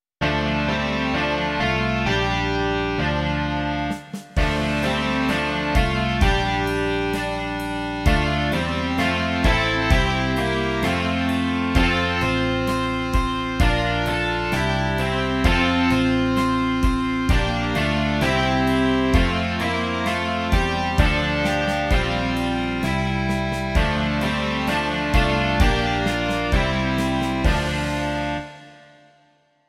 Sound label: rock